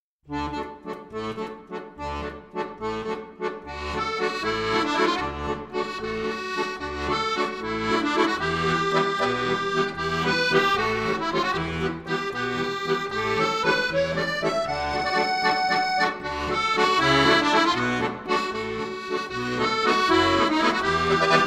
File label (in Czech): Akordeon